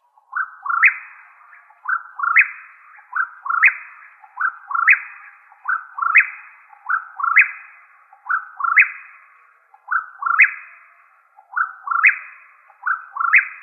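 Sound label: Whip-poor-will, Nightjars; WPWI; deviche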